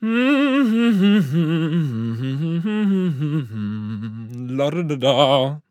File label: Humming; Astro; Paladins